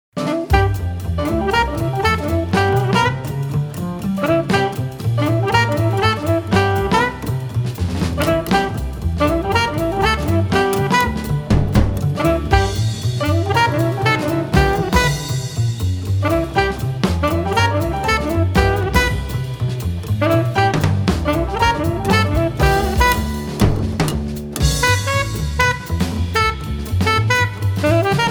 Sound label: bird of prey blues, quartet